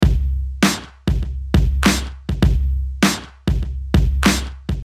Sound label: Beat 100 BPM Mix